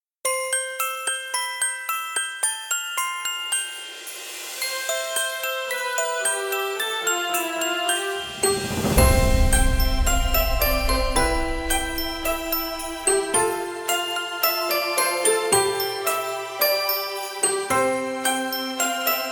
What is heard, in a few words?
christmas background music